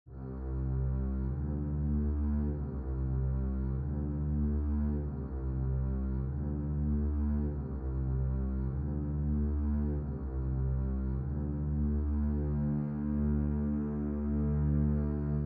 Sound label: C to D Alternating Low Tones, String Ensemble